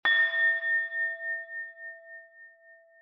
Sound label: tone; organic